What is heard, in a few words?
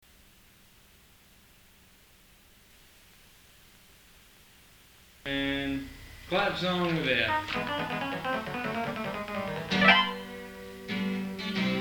clapsong in F